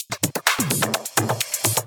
Minimal Fills, Minimal House; Minimal Loops

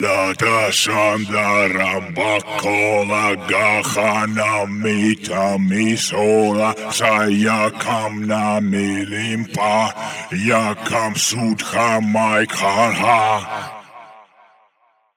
138 Shamanic Voice (Wet), Psytrance

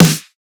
Snare, Psytrance; One Shots; Claps Snares

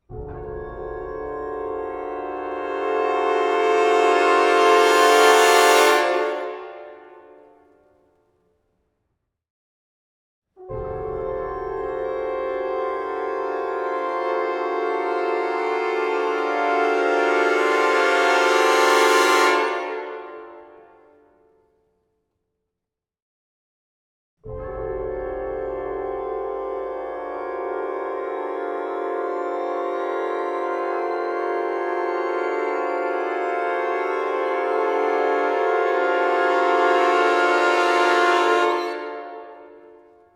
Rise - Orchestra Tutti Swell; Construction Kit; Boom Library; Cinematic Horror Bundle

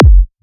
Kick, Psytrance; Drum Shots

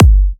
Kick, Psytrance; One Shots

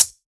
Hihat, Psytrance; One Shots

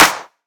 clap; Singomakers; Infected Psytrance; One Shots; Claps Snares

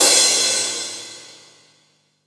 Crash, Cymbals; Minimal House

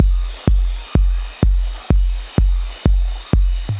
Drum Loop, Deep Tech House; 126; Drum And Percussion Loops; At The Fair; Rankin Audio; Deep Tech House Melodics

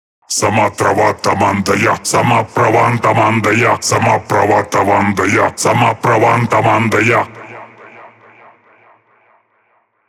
138 Shamanic Voice (Wet), Psytrance; Shamanic Voices

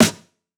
snare; Singomakers; Psytrance Mantra; Drum Shots

